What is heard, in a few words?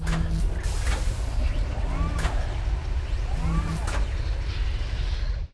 mech spider idle, monster